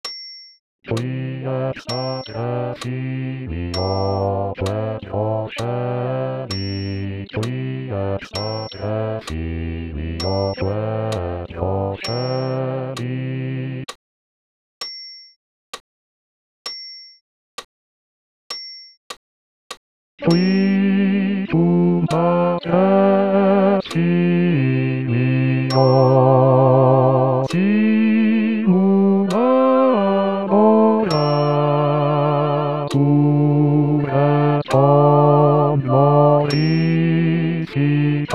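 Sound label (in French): Credo basse voix accompagnement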